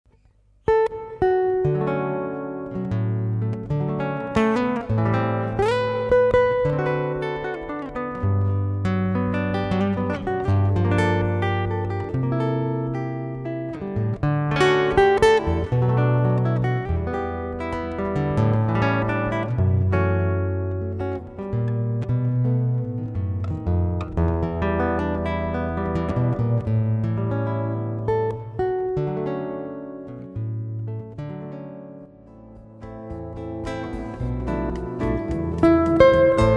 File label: jazz standards